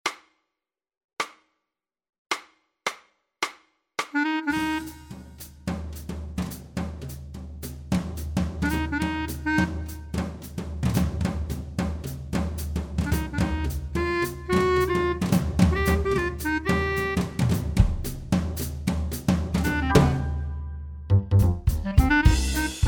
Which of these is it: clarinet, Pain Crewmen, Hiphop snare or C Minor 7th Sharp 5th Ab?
clarinet